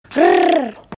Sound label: Rrr Sms Sound